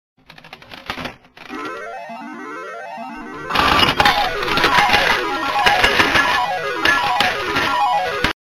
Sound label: slot machine